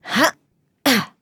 Grunt Jump; Seris; Paladins

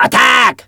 attack; Awesomenauts

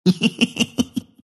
monkey laugh; Monkey King; Dota 2

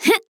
grunt jump; Paladins; Maeve

